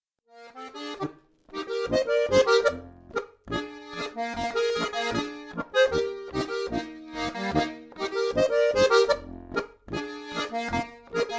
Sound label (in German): Boarischer